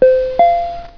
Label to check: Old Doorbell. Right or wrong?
Right